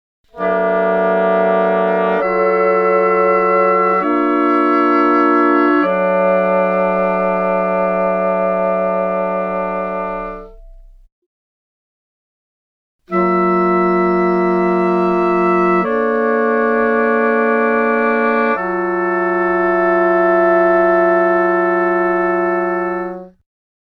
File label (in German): Akkordpuls